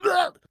Pirate Barrel; gamerip

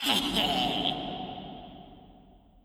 Laugh; Leure Laugh2

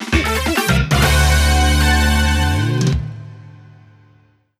Starting Grid (Battle Mode)

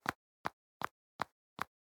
Ruin Royal Pointy Walk Loop

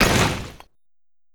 Rockpillar

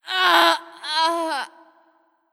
Heroin Death

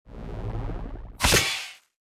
Skill Claw Attack

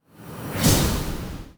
Small Orb Appear And Burst

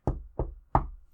Knock knock

